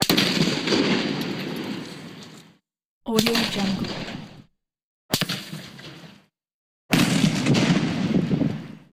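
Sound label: exterior ied explosions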